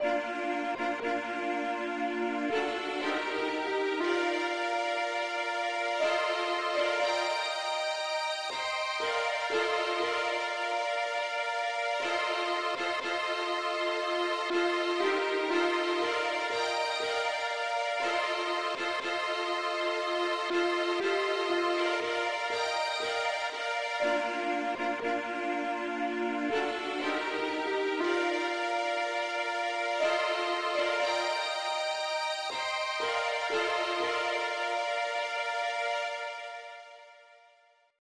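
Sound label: uke lead key b flat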